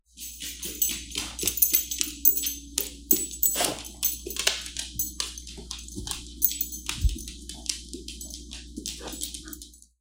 Dog Walking

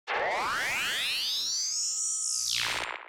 Anime Charge Sound

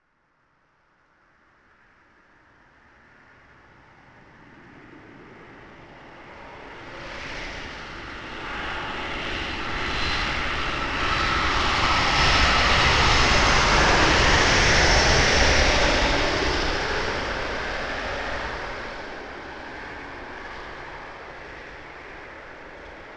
Airplane

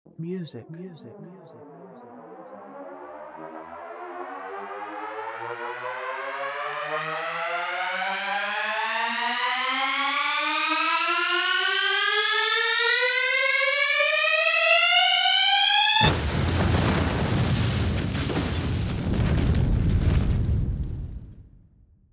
Music Riser

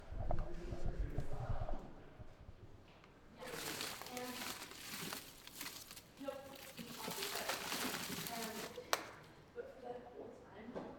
Plant Rustling Loud Conversation

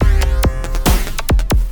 Attack Loop 140 Bpm

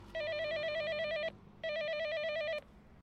Electronic Phone Ringing

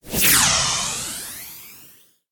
Fast Sparkling Whoosh

